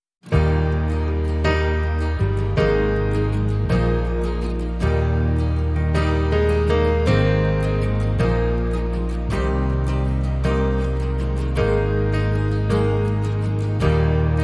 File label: All Good, No Drums